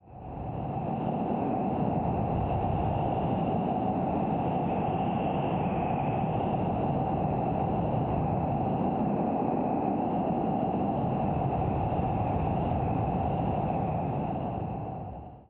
alien planet

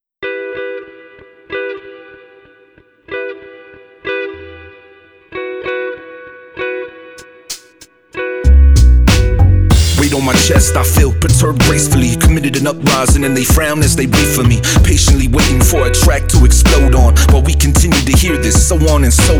virtual bass; ujam instruments

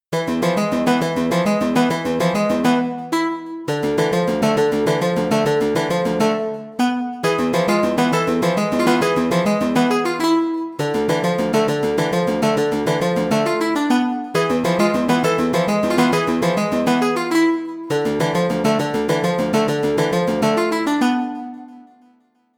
guitar clean

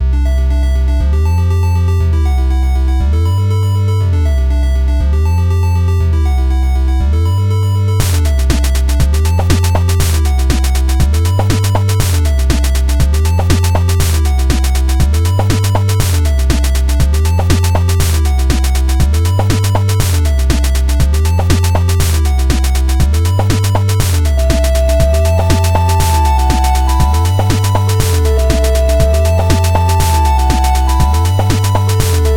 famidrums

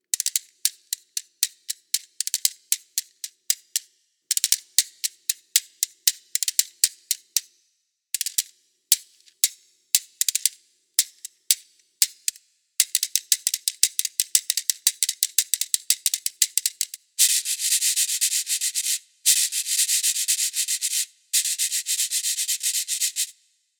afox rhythms

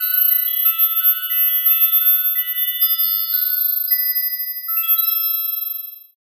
glassy windchimes

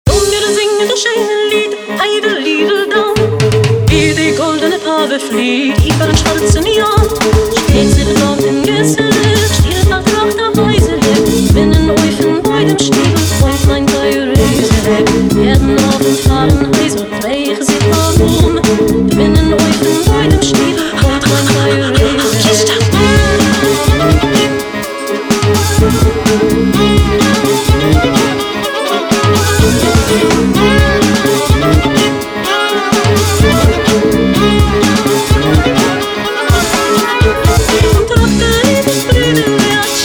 yiddish; chutzpah

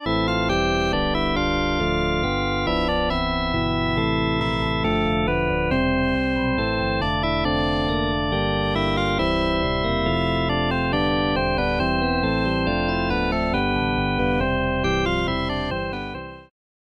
pure organ

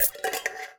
coins; nova sound

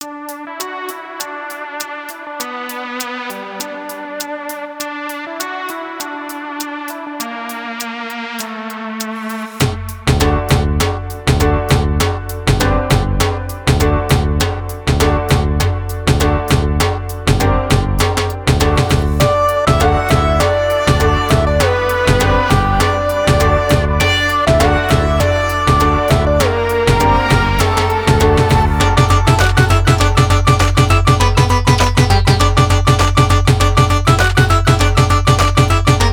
acoustic dhol

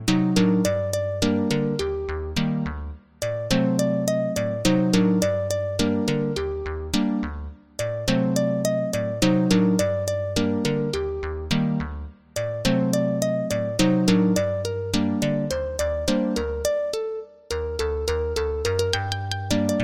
synth harp

